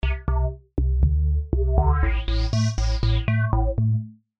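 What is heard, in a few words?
Synthesizer parameters LFO to filter cutoff, wah-style filter effect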